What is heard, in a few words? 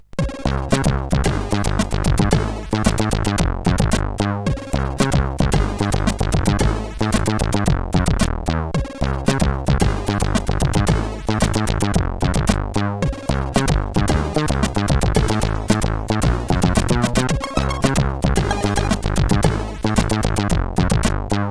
sid, CSG8580R5; PlotMusic; Warnock